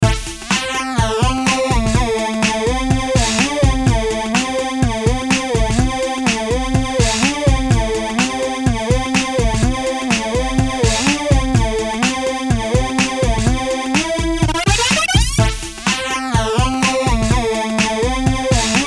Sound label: Beat Sweeper Lead, Beat Poppy Piano; Miscellaneous Loops